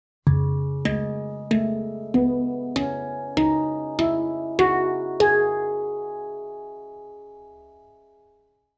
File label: Moon2 PENTATONIK CIS MINOR, moon ii handpan ciss moll